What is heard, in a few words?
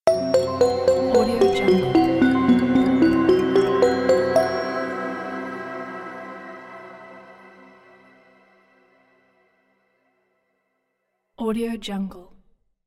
haunted space station logo